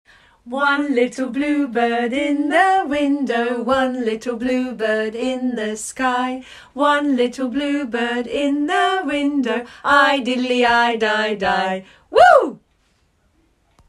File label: bluebird song